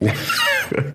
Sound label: Laugh; hunter biden